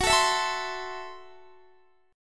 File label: Gb Minor 7th Flat 5th, Guitar; Gbm7b5; strummed; x,21,19,21,21,19